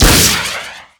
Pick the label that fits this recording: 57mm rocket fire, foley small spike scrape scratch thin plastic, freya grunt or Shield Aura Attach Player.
57mm rocket fire